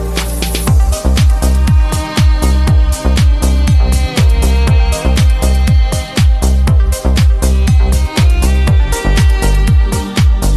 violin

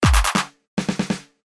drum roll sms ringtone

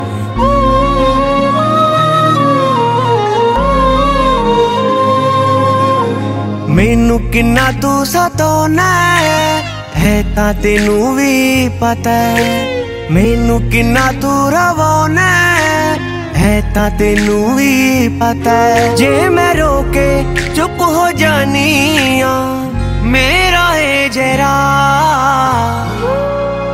punjabi ringtone

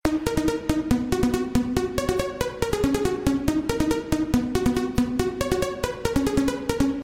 techno ringtone

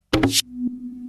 sony conga reverse ringtone

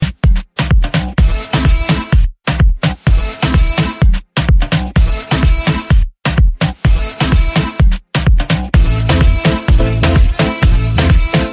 caller tune music

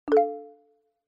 message beep ringtone

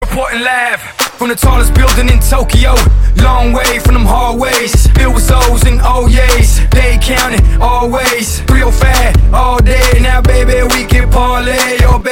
dance song tone ringtone

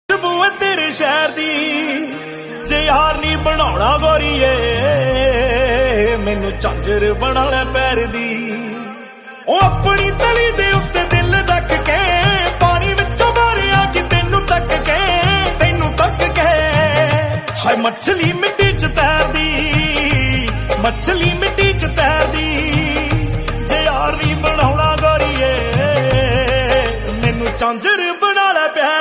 punjabi song tone ringtone; bollywood ringtones

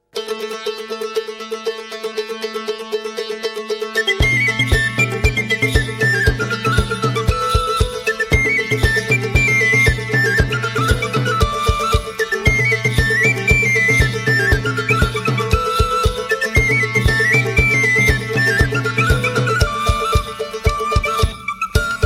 celtic music ringtone

